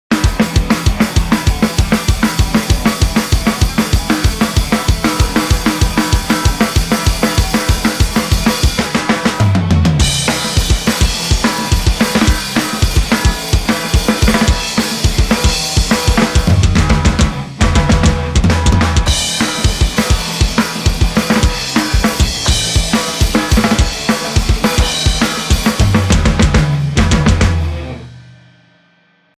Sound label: run home crying drums